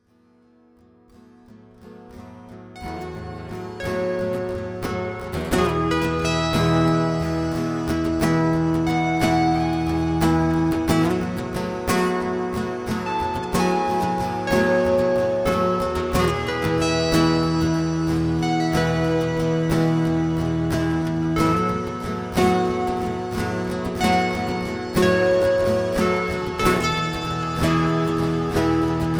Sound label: acoustic mix